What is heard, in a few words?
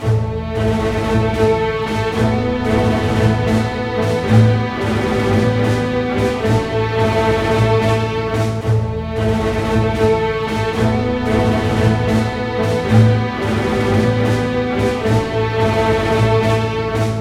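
112 war, orchestra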